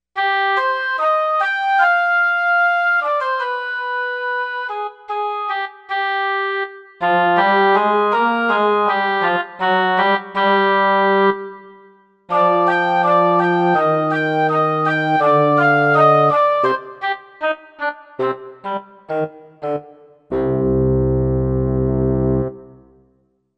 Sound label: Woodwind